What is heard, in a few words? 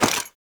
Loot Bag Drop; Objects; Pickups